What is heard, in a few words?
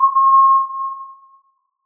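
bell nominal normalised; cccbr; glossop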